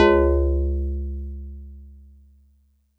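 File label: StarPiano DX100 C#0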